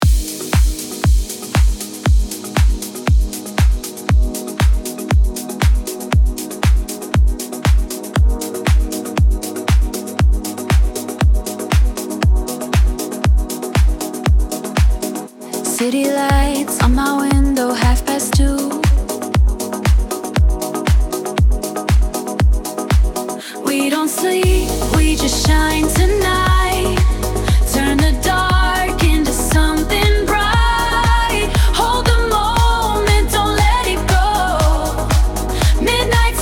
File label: midnight pop